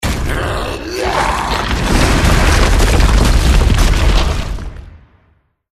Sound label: attack tusk earthquake